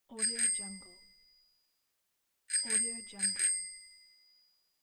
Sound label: bicycle bell